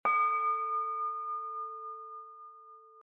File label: tone; organic